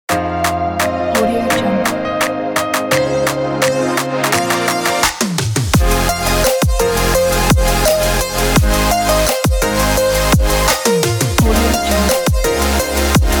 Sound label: bright hip hop motivation